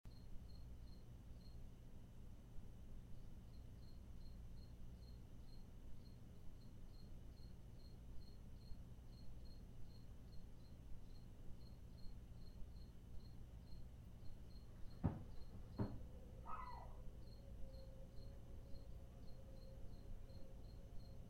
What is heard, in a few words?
thump and dog barks